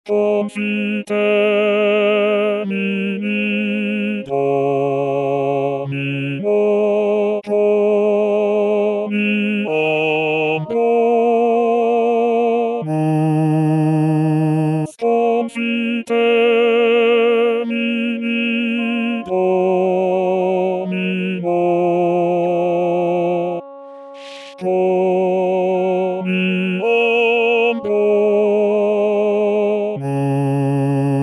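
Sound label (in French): Basse confitemini domino, re bemol majeur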